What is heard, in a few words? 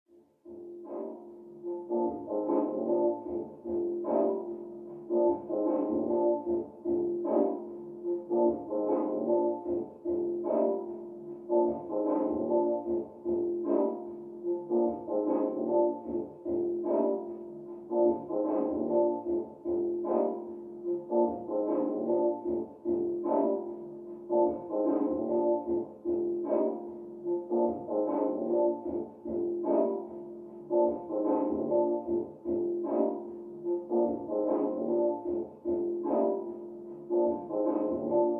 Hip Hop Beat